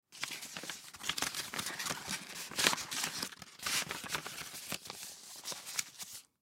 Letter Into Envelope Paper Stuff Sheet; Office; Blastwave

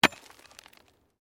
Digging Pick Hard Earth; Various digging tools and processes; Construction

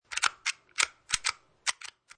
Lock

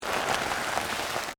Scrape Ramen Noodles; Texture; Scrapes (Sound Effects:Objects); BlastWave

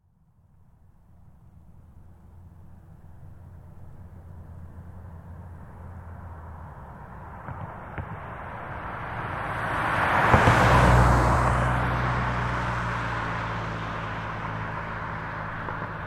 Car Highlander By Med; 2005 Toyota Highlander V6; Cars; Vehicles; BigRoomSound

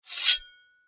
Warfare Knife Scrape Blade Metal; Knives; Combat

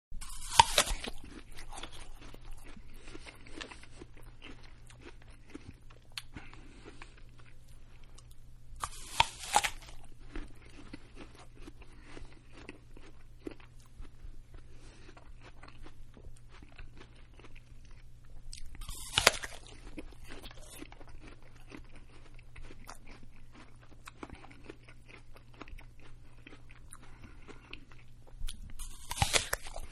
Apple Eat, Apple-Eating; Human

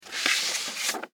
Envelope Manila Open Pull Paper Out; Office; Offices

